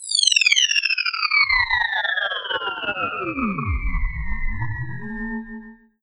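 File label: Riser Reverse Alien Ship Landing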